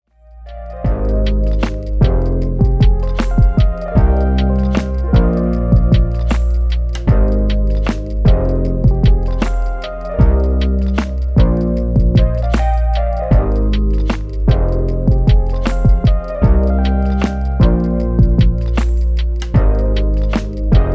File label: Beat